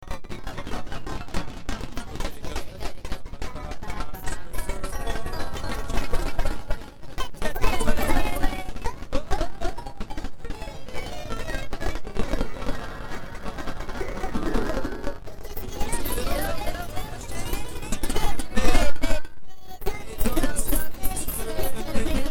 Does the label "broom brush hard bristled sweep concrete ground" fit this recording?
No